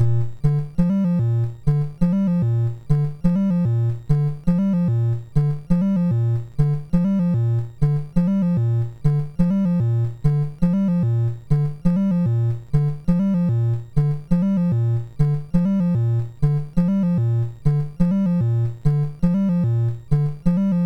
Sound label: sid, MOS6581R2; Donkey Kong 1983